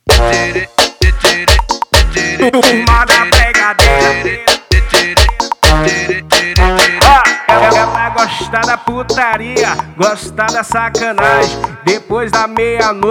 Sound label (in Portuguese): catucada violenta